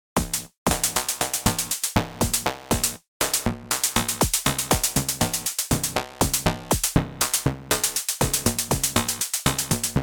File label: Modular